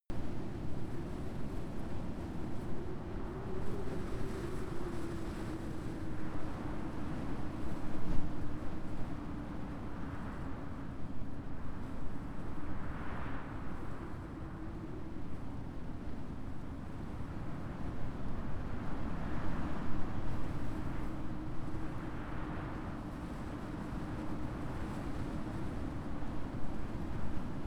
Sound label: generic wind loop warm